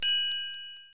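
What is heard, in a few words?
PERCUSSION; E-Lab; X Static Goldmine 1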